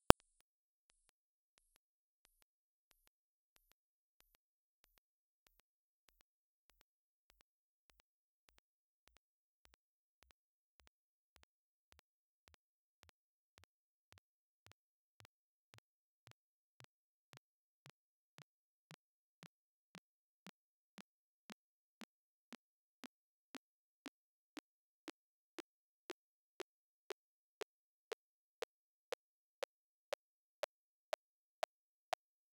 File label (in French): ordre 2 passe haut butterworth 3500hz; Filtre passe haut 2ème ordre selon l'atténuation de Butterworth; Fc = 3500Hz